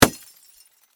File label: Impact Fluorescent, Light, Bulb, Smash; Smashes; Objects